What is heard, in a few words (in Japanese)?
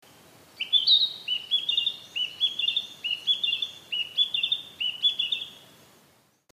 kibita